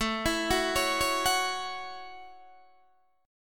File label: A 6th, Guitar, DADGAD